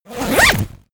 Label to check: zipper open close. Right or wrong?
Right